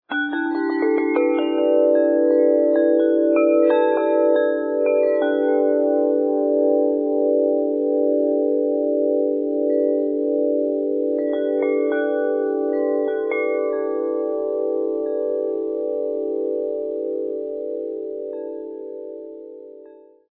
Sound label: wind chime